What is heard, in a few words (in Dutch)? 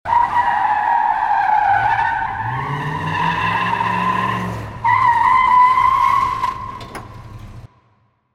Brandweer Piepende Banden